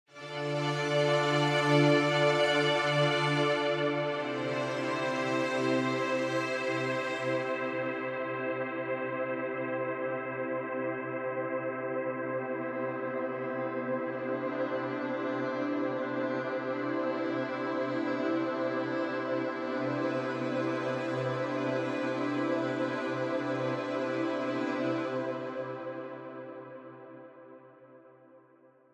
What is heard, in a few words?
Iceland Strings; Iceland Piano Strings Racks